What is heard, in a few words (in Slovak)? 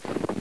krok v snehu